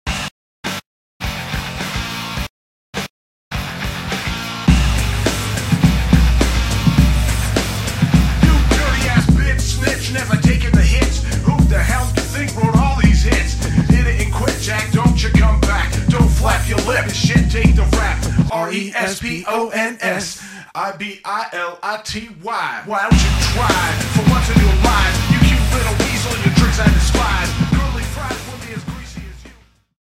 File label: Rap clean